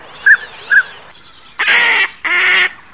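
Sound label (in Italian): Codone Anas acuta; Cordone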